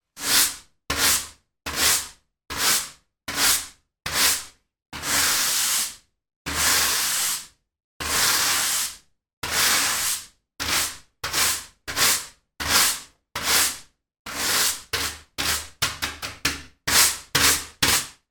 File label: sweeping hard internal floor